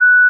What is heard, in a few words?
G flat 4, pure tones